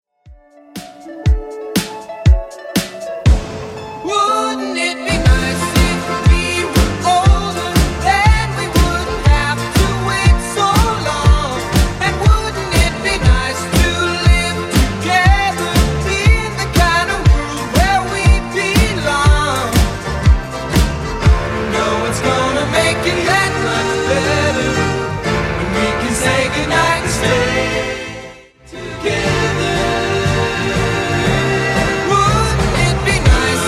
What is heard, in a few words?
60s Redrum 120bpm